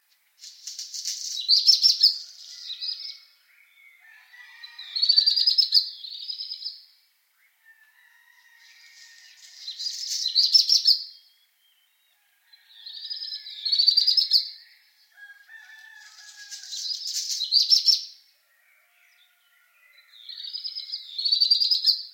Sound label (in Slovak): zltochvost domovy samcek, SpevVtakov